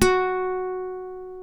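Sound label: F#3 FLAMENCO, FLAMENCO guitar; WorldSounds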